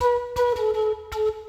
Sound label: flute, ethno; eJay